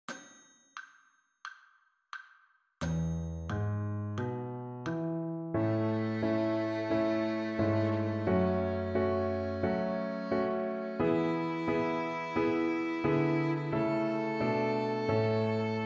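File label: A major 2 octave arpeggio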